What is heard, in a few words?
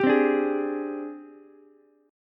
G sharp Major 7th Flat 5th Ukulele; JGuitar